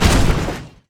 step heavy

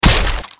damage

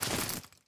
weapon holster; ins2; weapons

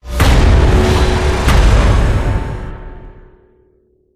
level up; gow; horde common

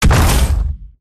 footstep; gow; silverback

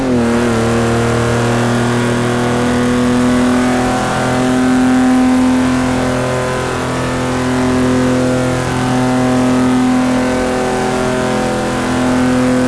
fourth cruise, vehicles cars; jag etype; tdmcars

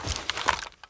gl begin reload, weapons; ins2

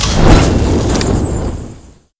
flamer stop, flamethrower; kf2; weapons; trapper

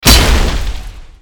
large weapon hit

